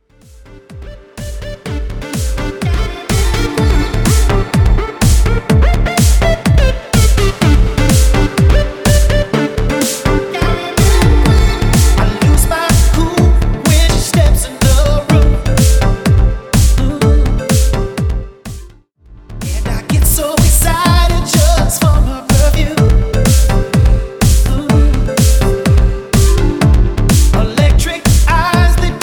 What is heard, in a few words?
House 125 BPM; House Rmx